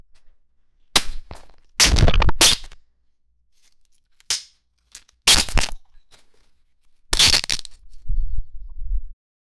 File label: wings beging ripped apart, gore